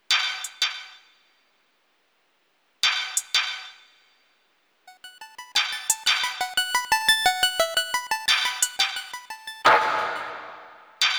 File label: Area 2 (Background music version); Elec Head